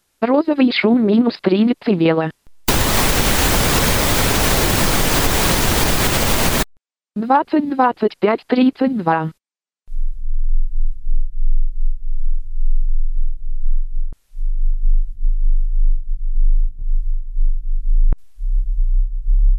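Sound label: test third-octave; Magnitola Avtozvuk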